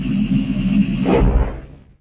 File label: Idle End